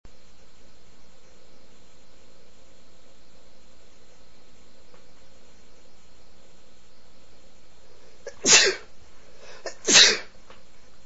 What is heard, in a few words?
plenty, male, i like sneezes